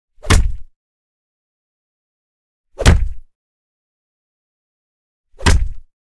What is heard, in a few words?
Punch (Deep), Martial Arts and Human Impacts; Hollywood Edge Martial Arts and Human Impacts